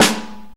Acoustic Snare

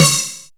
Shock Snares, Percussion

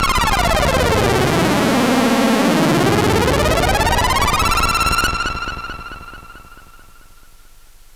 sci-fi FX

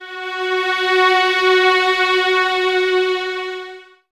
F#4, String Ensembles Violins; Miroslav Vitous; 11 Violins

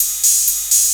NRG Cymbal

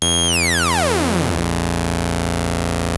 ARP 2600 F2, synthesizer; Hollywood Edge - Giorgio Moroder Rare Synthesizer Collection

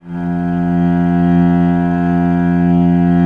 VL F1, VIOLE, Historical Instruments; USB Soundscan vol.23

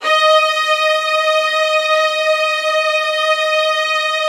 LDFV D#5, LOUD VIOLINS; Q Up Arts; Denny Jaeger Master Violin Library

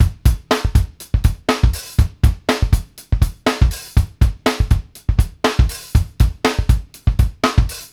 FOOT FUNK, drum; Sampleheads; New York City Drumworks VOL-1; KD JBEAT 120

